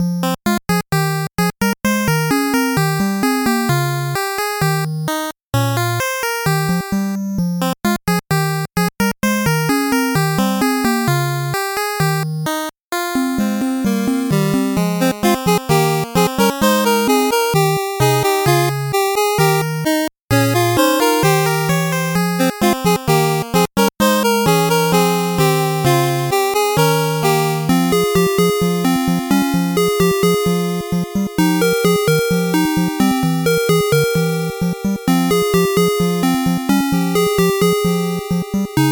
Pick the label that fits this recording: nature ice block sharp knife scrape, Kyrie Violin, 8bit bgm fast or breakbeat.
8bit bgm fast